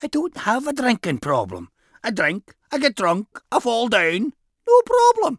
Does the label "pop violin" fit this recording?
Yes